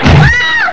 fem hit; carma

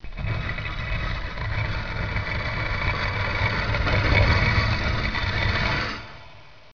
rotate

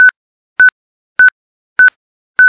hospital

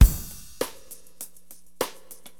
Drums, Acid Jazz Funk; LiveAct Pool

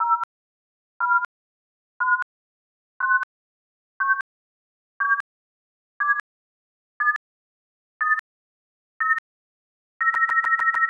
self destruct countdown; Earth and Beyond; EA Games

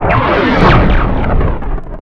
hotspot

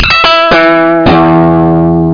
Elec Gtr

